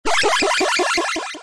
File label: win sound; robot wants kitty